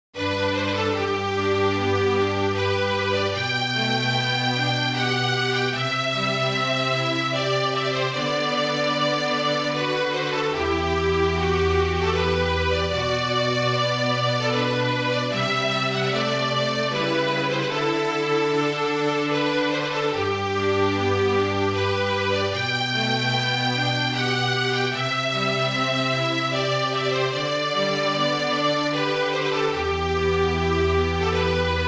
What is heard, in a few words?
Bygone Days trio, string